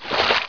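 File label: water rat; tnpdemo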